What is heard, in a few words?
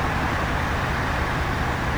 Dr14 idle; finnish